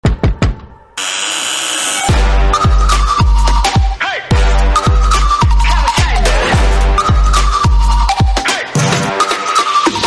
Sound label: instrumental